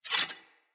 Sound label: Sword Slice Accent; Weapons; Player; Garren Sword